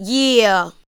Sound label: ADLIBS